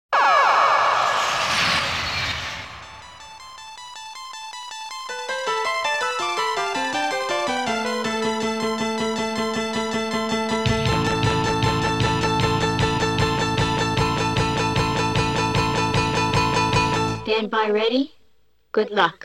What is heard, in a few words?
COIN OPENING; Taito Game Sound Music 4; Darius 2